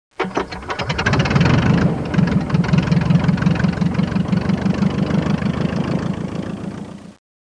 bateau repart; Arc En Ciel Et Le Lagon Merveilleux

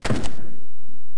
pas kronos terre; WehikulCzasu; Time Machine; Bruitages

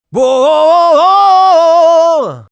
chant; Mobiclic51

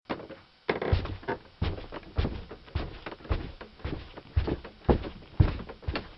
pas; MOBICLIC161

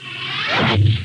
cracheur; Rainbow

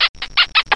bisou

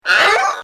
PERROQUET; MOBICLIC 72; CPLUSCLAIR

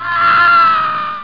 Sound Hurle

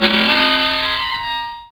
Avion; alone in the dark 4

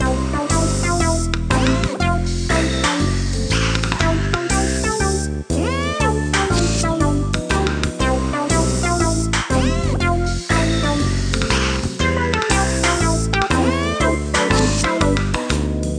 Musik atelier

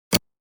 CRAN; MOBICLIC161

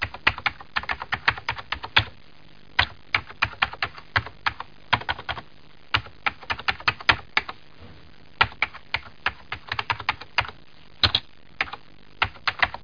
clavier; packard bel

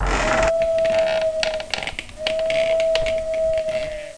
bruit; MOBICLIC37

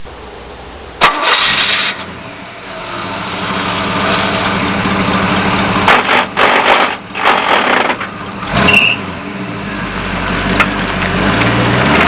engrenage, vehicles; Pinnacle

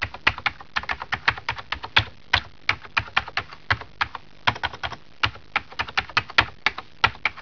clavier; packard bel

